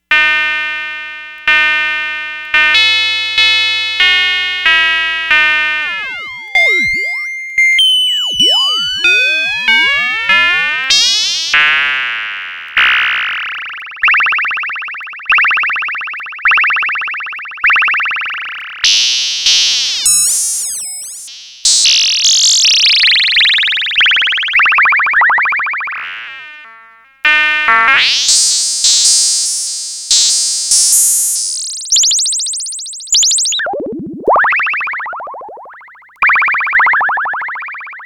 RING MOD EFFECTS, Moog Micromoog

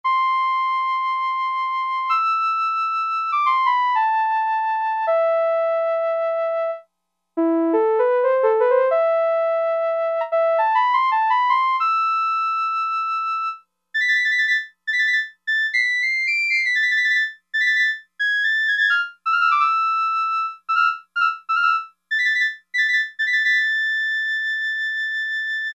Flute, Roland Juno-60; Factory presets

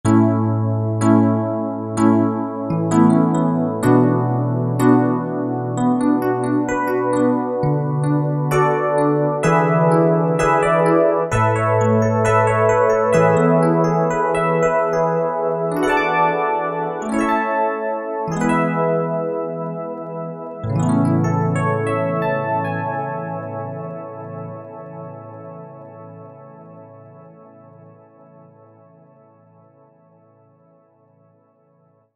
FM EL PIANO, Old School Keyboards; Universal Sound Bank; Soundscan 09 Old School Keyboards

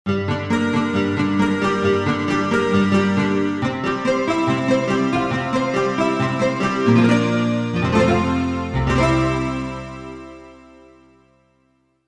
12 String G, Korg MSC-1S; Korg MSC-1S Variety

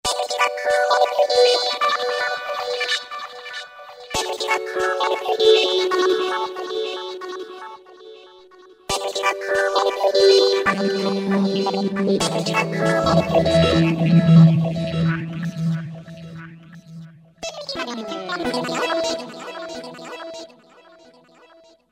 Roland V-Synth; Babylon Talk; Factory Patches

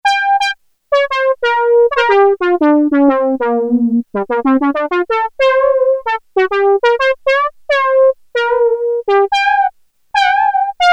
Trumpet With Spit; Sequential Circuits Prophet-5 (Ver.3); Factory Patch; File 3 Programs

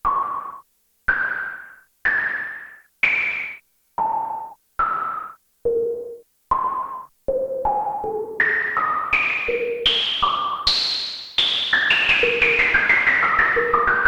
NOISE DROPS, Korg DW-8000; Factory Patches

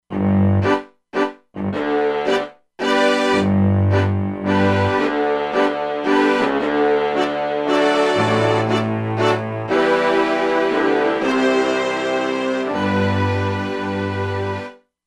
Brass Strings; Factory Presets; E-mu Proteus-2

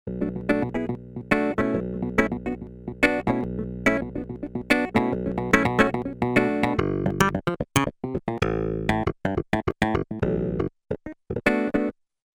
Clav, Roland JV-880; Factory Patches

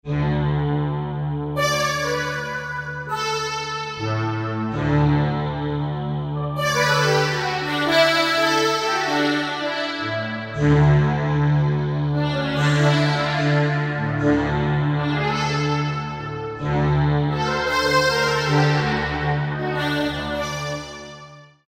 Eastvox; World; Roland SR-JV80-05

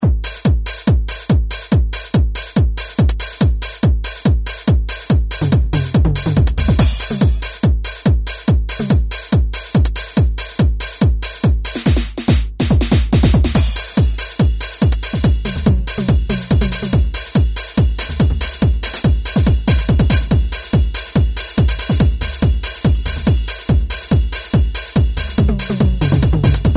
Electro Kit; Sonic Reality Modern Songwriter

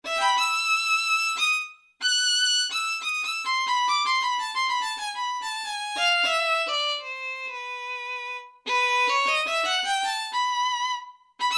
Violinist; Factory Preset examples

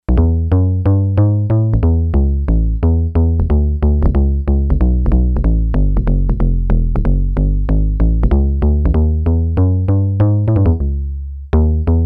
Yamaha DX11 Wood Bass; Factory Preset Voices

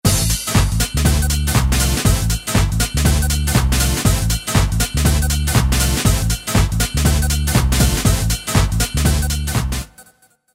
US Hard House, Roland D2

